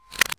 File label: Shutter click